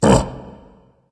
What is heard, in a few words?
ugh dragon; fafnir